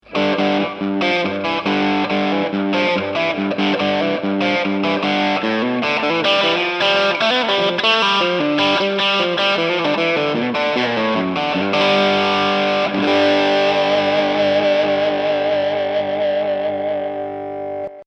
Gretsch steg

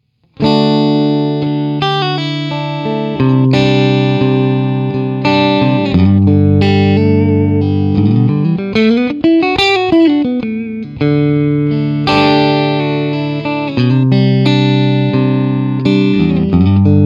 Halspickup und Humbuckerhalsspule

